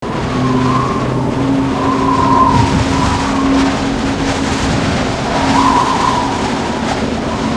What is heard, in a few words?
Wind; Seer; GamigoNA